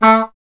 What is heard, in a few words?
E. Horn